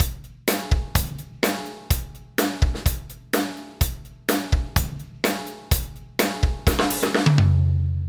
drums

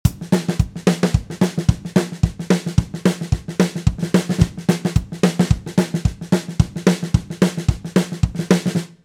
snare subdivision

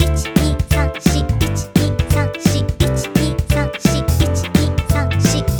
too fast

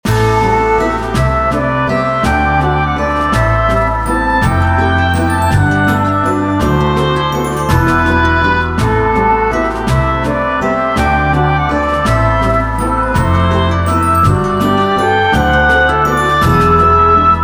dorian short

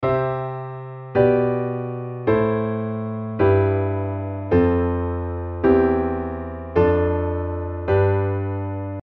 piano bad

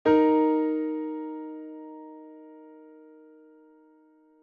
interval es bb

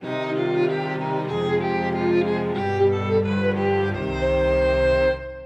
classic minor cadence

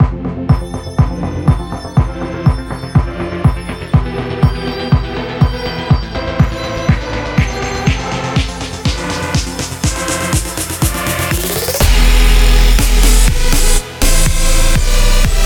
III EDM

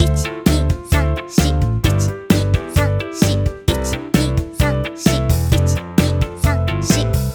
4-4 shuffle count

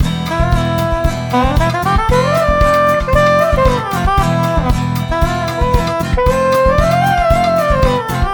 phrygian spanish solo

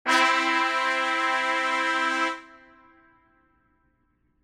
env trumpet sus

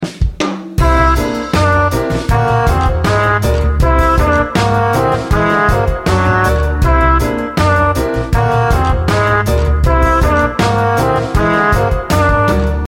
wholetone jazz

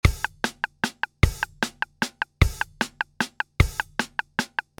poly 3 4

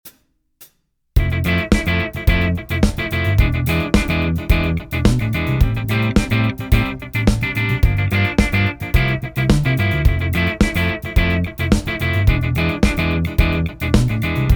Transient bad

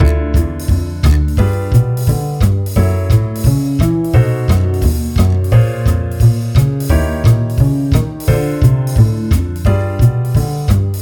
chain maj3up

